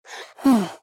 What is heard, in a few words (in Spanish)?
Suspiro